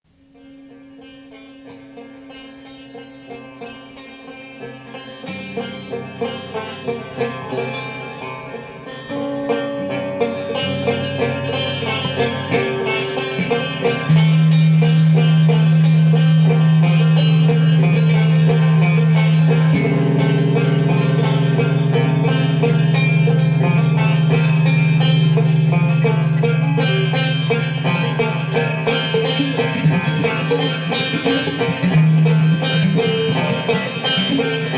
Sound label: Whale Bone Whistle